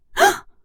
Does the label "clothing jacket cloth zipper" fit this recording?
No